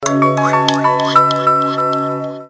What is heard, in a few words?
message tone